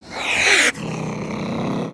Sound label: Pet Cat Angry; creatures pets; Lego